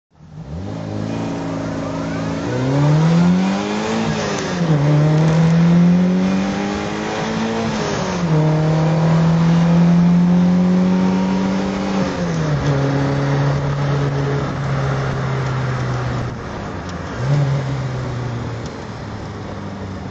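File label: honda s800 drive, engine sound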